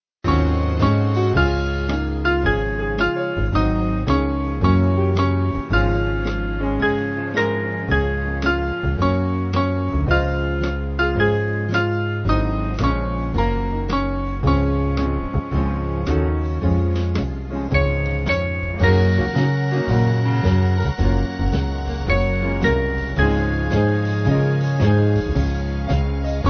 American Band; Swing Band; 4/Db-D

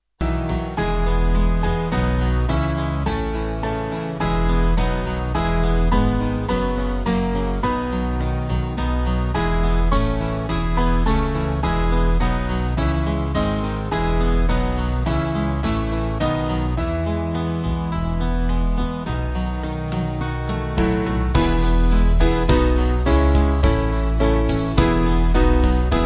While Shepherds, Winchester Old, Band; Small Band; 6/E-F

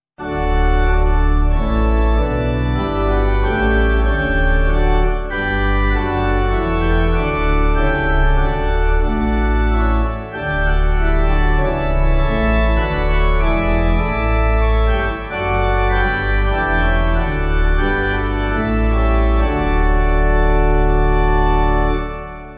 pipe organ

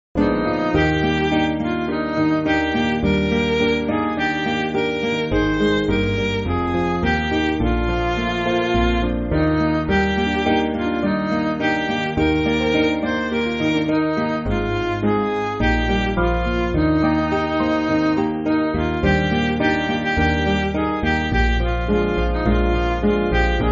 Midi; Alas! and did my Savior bleed; Hudson

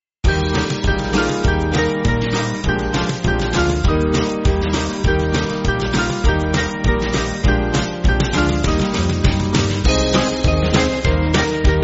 Band

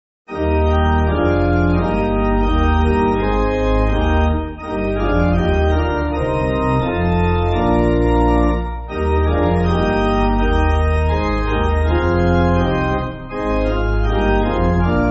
Pipe Organ, Music Backing Files for Churches; God Bless The New Year; Corners; Corner's Gesangbuch

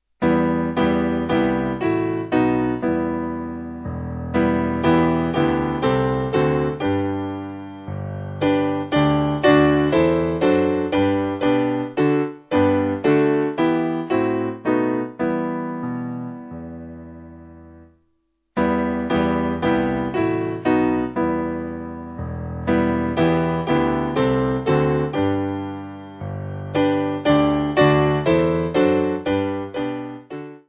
Piano

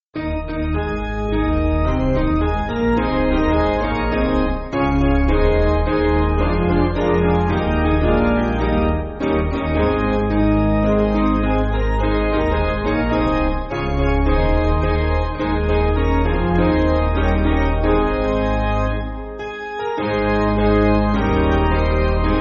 Piano, Backing Files for Churches

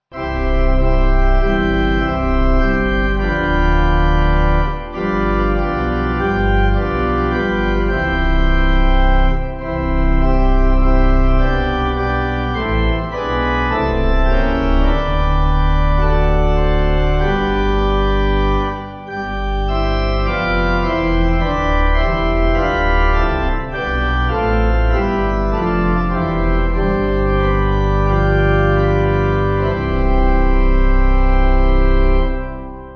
Pipe

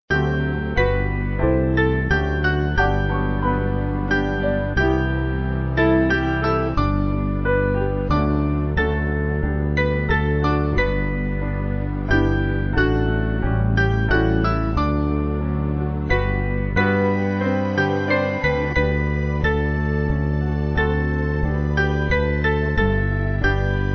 Piano, Free Music Backing Files for Churches; Lowry; Mainly Piano (CM)

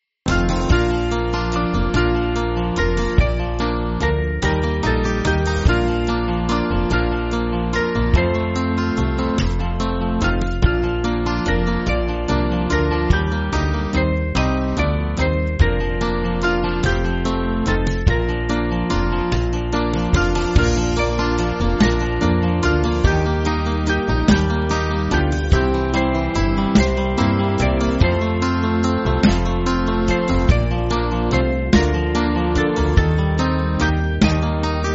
With Friends On Earth, Band

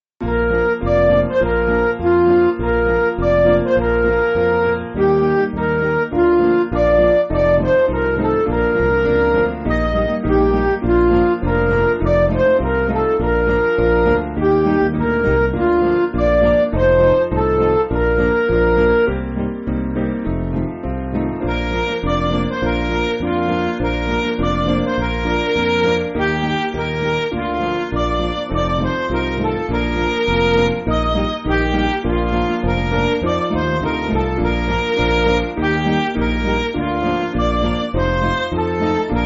Midi, Music Backing

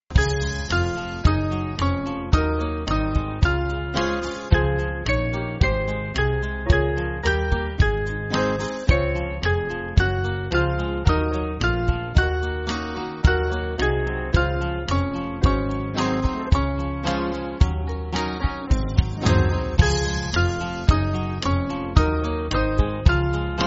Band, Free Music Backing Files for Churches; Buckland; 4/Db-D

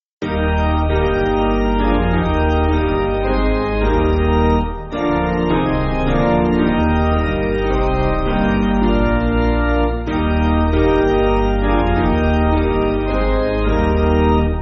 Let Us Thank, piano

